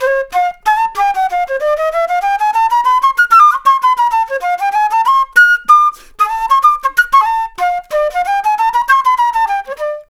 Flute Solo, Jazz

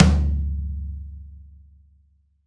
Rock Tom, Roland BK-5

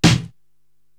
SD Big Door, Korg TR-Rack drum machine

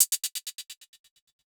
Gated Cymbal; musicradar

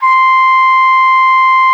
TROF C6, TRUMPET; Xsample VOL-3

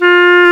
WND CLARIN, Clarinets; Clar Bryt

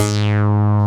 SYN STBAS; Sound & Vision - Gigapack I CD 2; JD 800/990

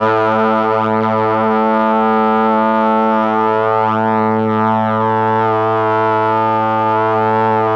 BRS A, BRASS; Best Service ProSamples vol.55 - Retro Sampler; AKAI; BRASS+STRING

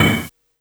Perc Matsuri Casio SA-1

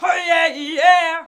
VOX VOX; Sound & Vision - Gigapack II CD 2

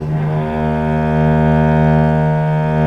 VL D#1, VIOLE, Historical Instruments; USB Soundscan vol.23

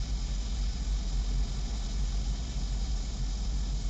Ambience Loop, outdoor

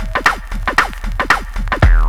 Bossa Back 115 bpm, breaks; Zero-G Beneath the Planet of the Breaks

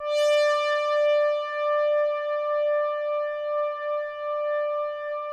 OBERHEIMS

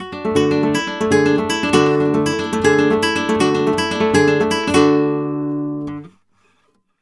guitar arpeggio, Flamenco; Best Service ProSamples vol.46 - Flamenco

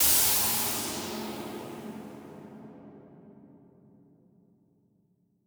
Noise Verb Decays; stereo toolkit samples

